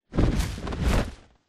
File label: flyer fly close; Titanfall 2